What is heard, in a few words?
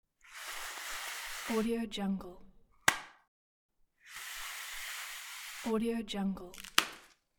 toilet paper